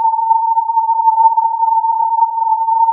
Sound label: filtered input